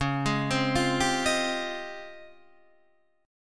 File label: C sharp Major Sharp 11th, Guitar DADGAD